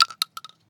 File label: shell 12ga default; STALKER; IWP